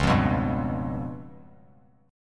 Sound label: Db 7th Flat 5th, Bass Standard; Db7b5 strummed; JGuitar